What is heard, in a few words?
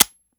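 weapon fire first player; gmod; viper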